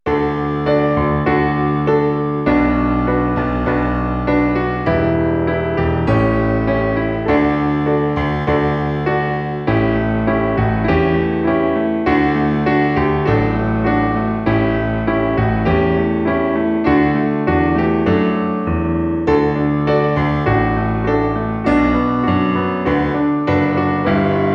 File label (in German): Still Still Still Piano